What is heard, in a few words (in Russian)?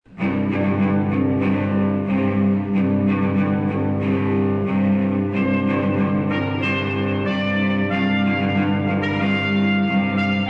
violonchel truba; Pavel 1; F.Shubin